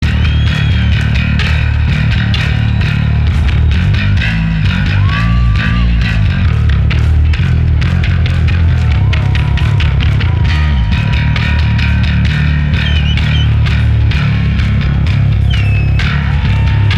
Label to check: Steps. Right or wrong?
Wrong